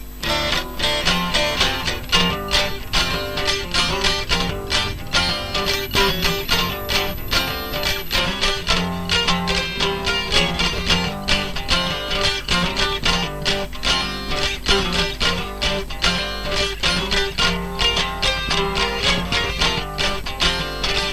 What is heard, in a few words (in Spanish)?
base funky guitarras